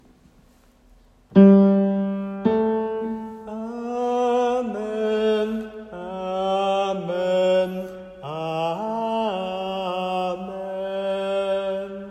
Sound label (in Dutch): Amen stem 4